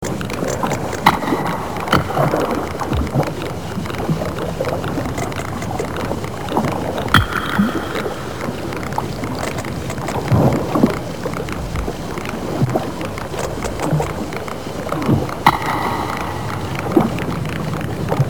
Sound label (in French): cliquetis